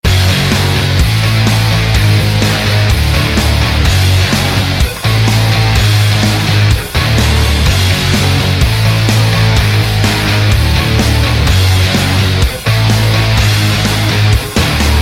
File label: Elec Guitar